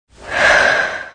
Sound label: breath; juniper